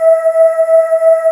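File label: Synth Vox F#3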